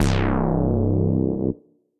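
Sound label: Fm House Bass; Ableton; Skitter and Step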